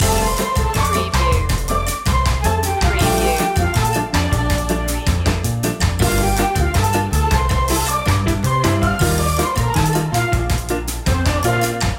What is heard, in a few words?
latin destination loop